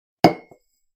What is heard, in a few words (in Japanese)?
タンブラーを置く音